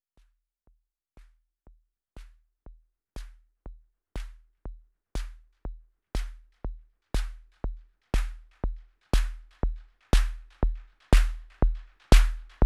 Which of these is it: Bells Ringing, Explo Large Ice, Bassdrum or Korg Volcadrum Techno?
Korg Volcadrum Techno